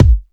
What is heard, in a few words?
phat steel kick drum g sharp key; Duration 0:01; kick drum samples